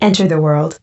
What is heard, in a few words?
Vocal Phrases; Vengeance Samples; Vocals